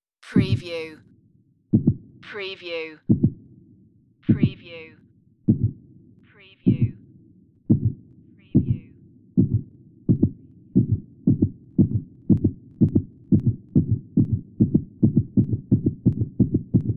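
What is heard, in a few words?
Heartbeat Speed Up Low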